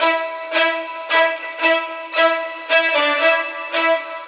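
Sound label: STRINGS 1, 112 bpm, Funk; USB Soundscan vol.41; AKAI; Roots Funk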